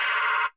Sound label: Amb Hit, Drum Machines, Effect; Yamaha DTXpress